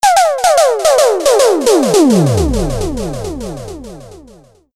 Loop Snr, Drums; leloops

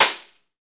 snare, Roland; Club-50 - Foundations Roland; Ext. Snare 4

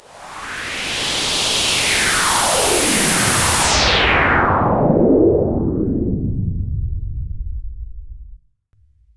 Swept Noise

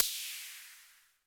HiHat & Crash, Roland TD-20; HiHats

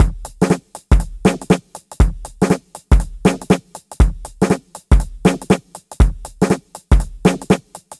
jazzmania 120bpm, downbeat dance grooves

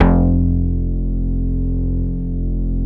BASS F2; USB Soundscan vol.51 - House Side Of 2 Step; AKAI

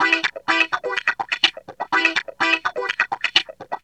GUITARS 125

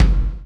CHAIN; Spectrasonics - Backbeat

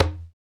PRC CONGA, Roland

